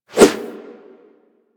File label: Melee Idle Whoosh; Warframe